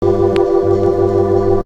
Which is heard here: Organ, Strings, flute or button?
Organ